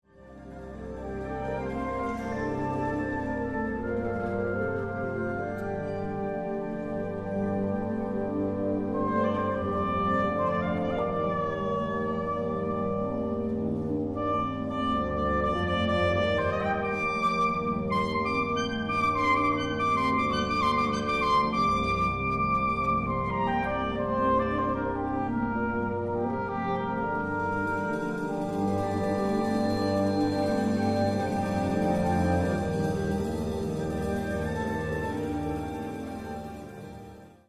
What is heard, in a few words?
sopraansax solo; Saxofoon en orkest